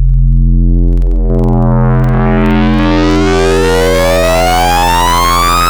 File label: Scream; Synthetic's Serum Collection